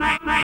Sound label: CROON HORN, Human; Distored Reality